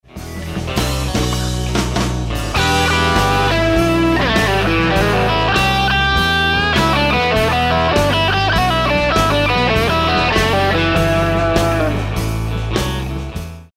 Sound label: Lick, guitar